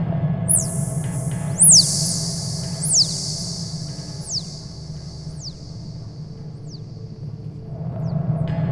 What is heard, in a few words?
Percscape; Sonic Foundry (Sony Creative Software); Ma Ja Le; Soundworlds